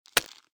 reel damaged, fishing; Ultimate Fishing Simulator 3 Demo

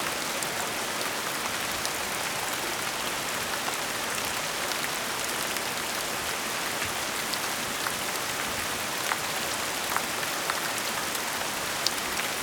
Light Rain; UltimateFishing2020

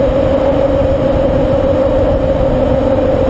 Asphalt Wheel Noise; Ultimate Fishing Simulator 3 Demo

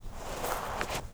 MOVEMENT Snow; mono; UltimateFishing

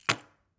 Position down; UltimateFishing2020

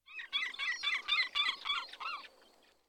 Seagull Squawks; UltimateFishing2020

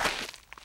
STEPS Gravel, Walk

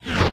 Spawn; UltimateFishing

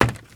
STEPS Wood Creaky Run; UltimateFishing2020

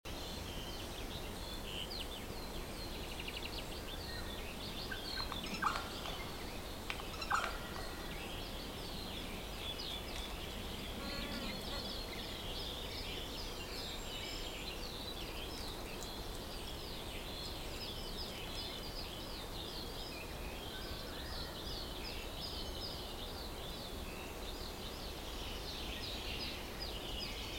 Forest near meadow spring ambience loop; UltimateFishing2020

